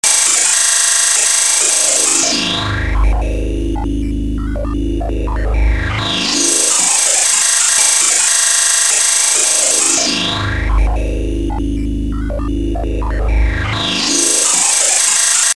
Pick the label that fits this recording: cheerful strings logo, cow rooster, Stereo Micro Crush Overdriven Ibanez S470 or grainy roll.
grainy roll